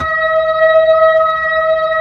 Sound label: B-3, Blues; Keyboards of The 60's and 70's